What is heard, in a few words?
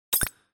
hit